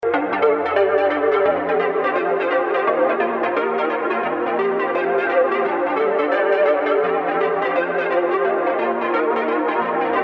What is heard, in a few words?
lazy crazy flute test; weeklybeats-2020